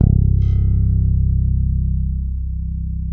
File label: bass, sustain; Sampleheads; Will Lee Bass Library